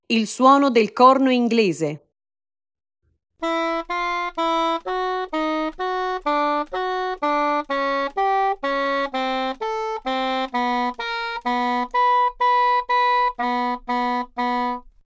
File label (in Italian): corno inglese